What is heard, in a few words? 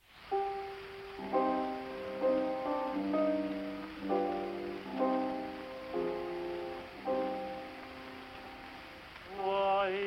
Gramophone